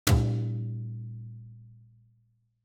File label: ambient